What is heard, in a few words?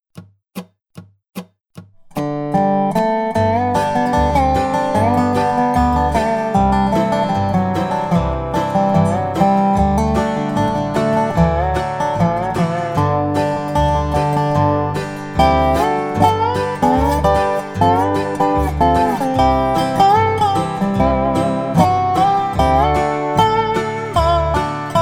key of G Dobro and backing track